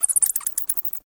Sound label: batsqueak; World Of Goo